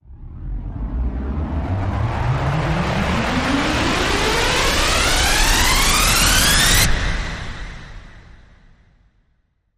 Riser

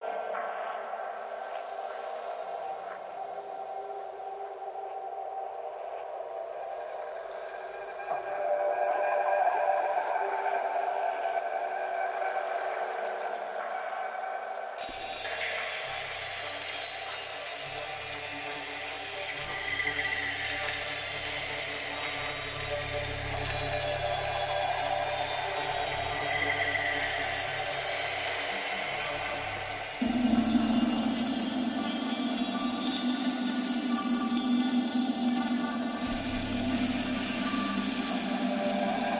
Drone, Light

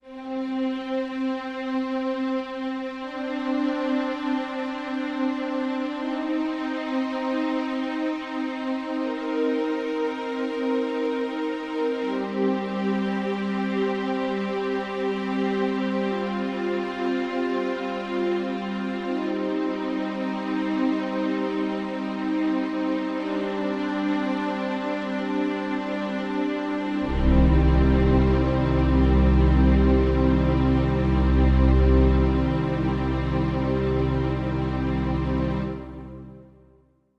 Strings Only

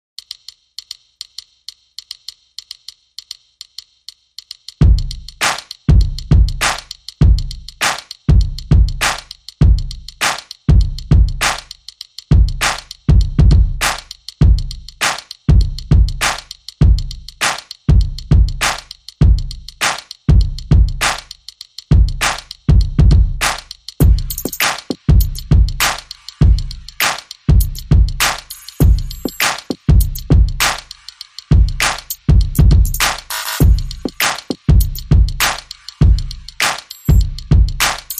Beat

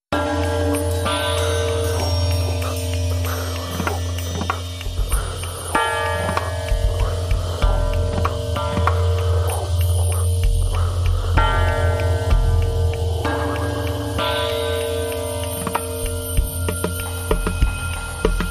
Bathing Bowls

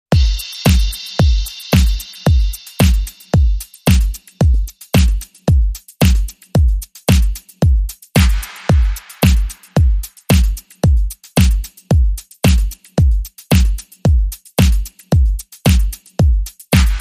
Low Mellow Beat

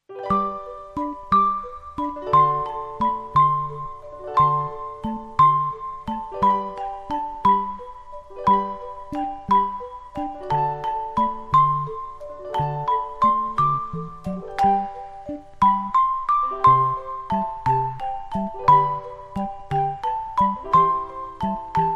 Sweet Carillon

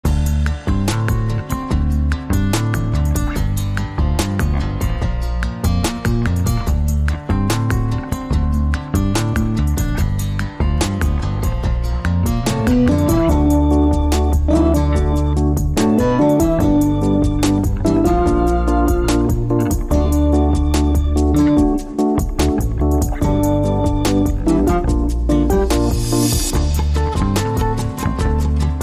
Country Hop

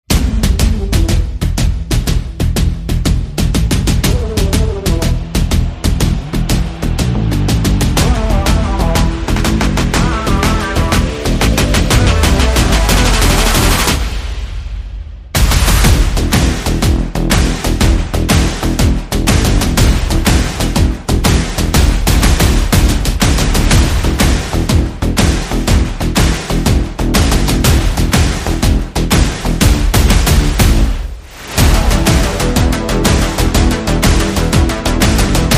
Fire It Up, No Horns

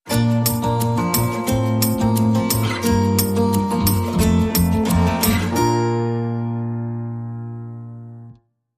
Acoustic, Sting Underscore

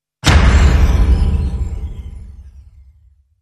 Main Hit

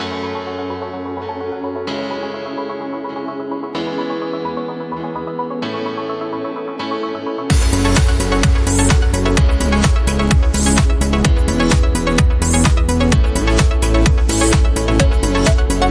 Without Saxophone and Vocal

